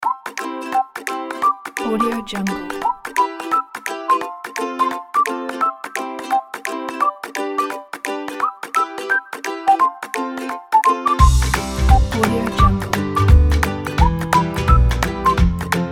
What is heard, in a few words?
the happy music kit